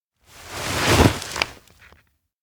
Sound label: note clutch cry; anemoi